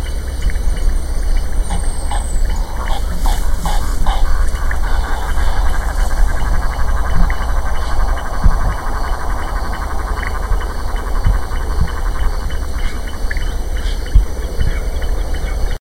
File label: bird